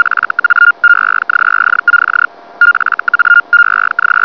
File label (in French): HELL, Radioamateur Français lançant un appel en HELL; HELLSCHREIBER